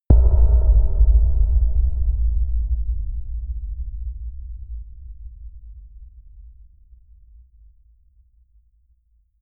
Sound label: sub impact; hits impacts